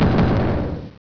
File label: near miss